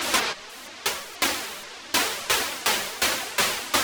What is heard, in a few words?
Juno-60 Arp 125BPM